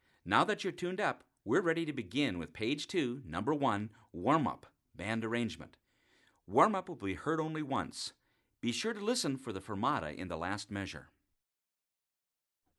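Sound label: warm-up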